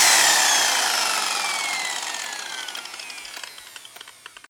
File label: whirdown WHH